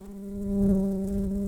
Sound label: Humilis workers, bumblebees